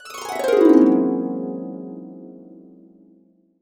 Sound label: Harps; USB Soundscan vol.62 - Complete Harps; AKAI